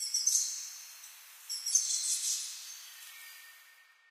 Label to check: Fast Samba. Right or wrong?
Wrong